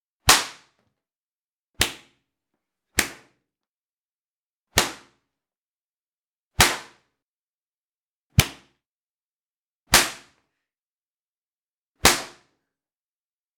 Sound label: Belt Slaps On Table Unprocessed